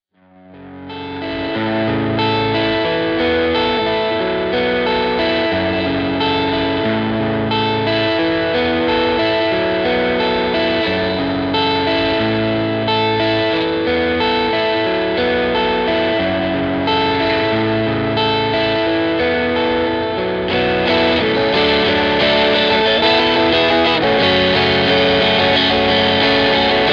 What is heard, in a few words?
ts-9 analogman